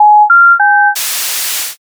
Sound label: modem